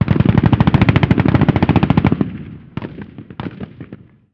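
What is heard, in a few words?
gun us assault medium; ModernWarfare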